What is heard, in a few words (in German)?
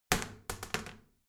toilet seat lid wall open heavy, badezimmer